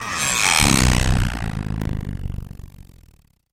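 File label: endings outro, disappearing fading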